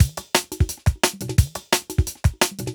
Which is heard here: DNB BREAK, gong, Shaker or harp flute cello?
DNB BREAK